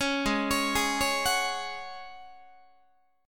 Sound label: G sharp 7th Suspended 4th G sharp, Guitar; G#7sus4; arpeggiated